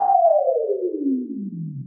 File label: death; KNOCKIN; Revista CD Expert 50